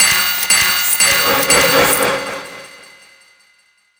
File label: berserk tick